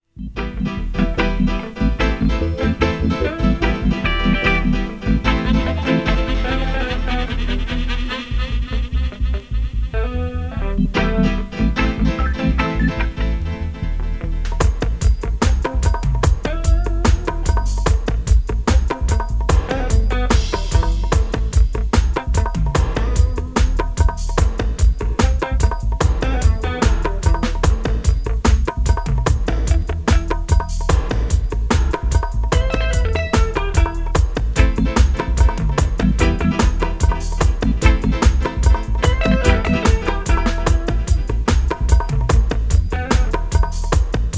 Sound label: chant dub